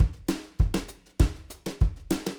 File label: Drums, Jazz